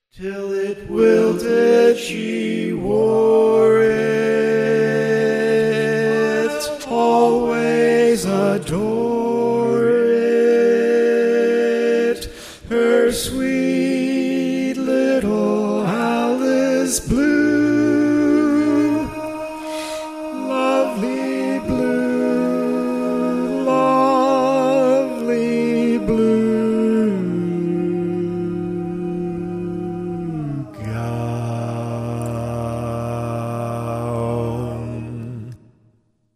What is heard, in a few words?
Bari, barbershop